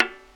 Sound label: VIOLA